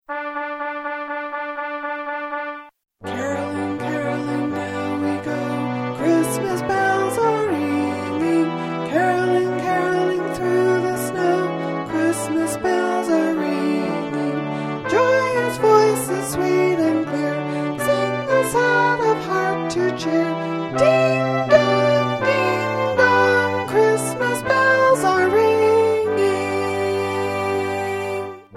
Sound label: Caroling Soprano